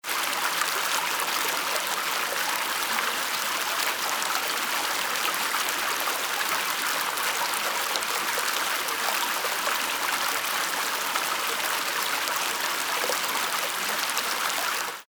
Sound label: water flowing